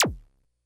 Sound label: Bleep A, Drum Machines, Korg DS-10